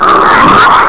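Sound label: alien grunt alert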